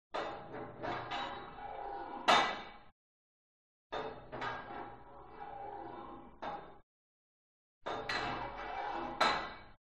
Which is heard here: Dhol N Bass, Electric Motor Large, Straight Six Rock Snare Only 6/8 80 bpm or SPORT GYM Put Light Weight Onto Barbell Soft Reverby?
SPORT GYM Put Light Weight Onto Barbell Soft Reverby